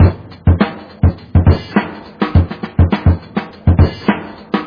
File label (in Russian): solo na barabanah